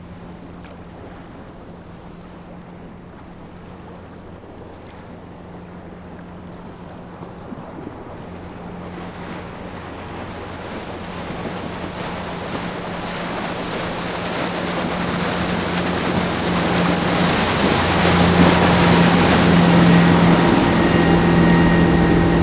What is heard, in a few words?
EXT Fish Boat by Near; Poetry Project